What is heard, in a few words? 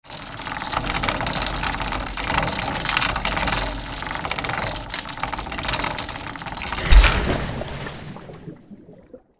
Ship anchor release water impact